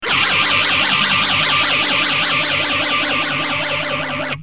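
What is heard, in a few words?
guitar, final phase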